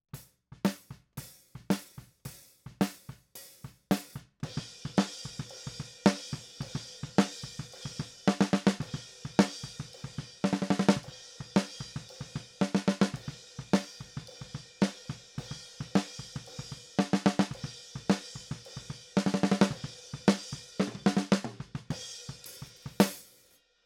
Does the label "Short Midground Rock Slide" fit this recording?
No